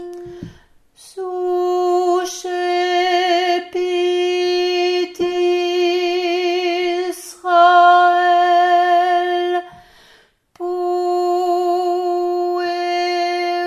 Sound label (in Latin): suscepit alto